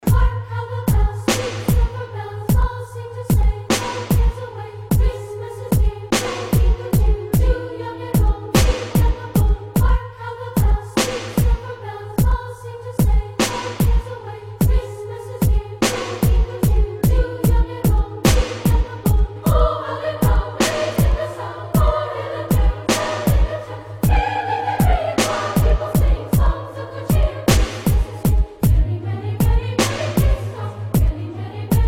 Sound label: carol of the bells dubstep remix; home alone